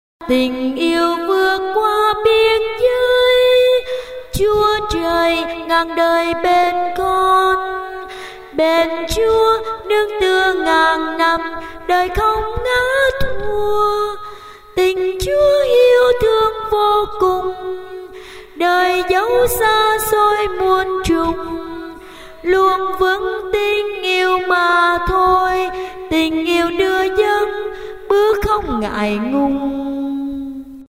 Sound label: basso